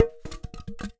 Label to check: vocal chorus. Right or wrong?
Wrong